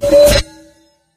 ui select; Source Engine; Portal 2

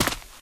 concrete, player footsteps survivor walk

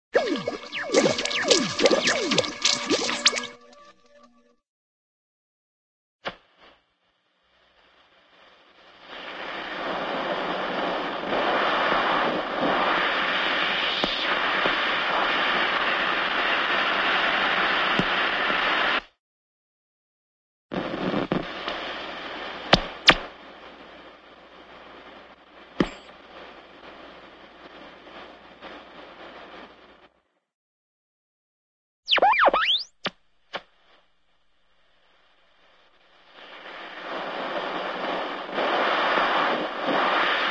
radio, tuning static; cartoon; carol's

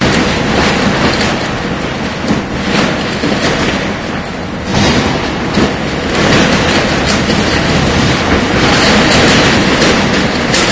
debris loop; video games; Left 4 Dead 2; Source Engine; ambient; rainscapes

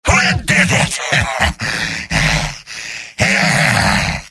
cheers; demoman; video games; source engine; tf; mvm

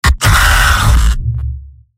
heavy pain severe; mvm

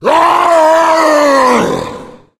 tank yell, voice yell; Left 4 Dead; Source Engine

